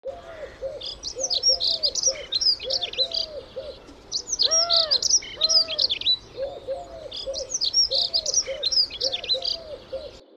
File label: dunnock